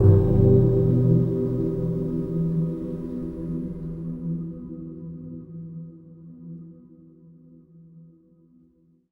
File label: Gong; Sonic Foundry (Sony Creative Software) - Friendly Fire; Single Gongs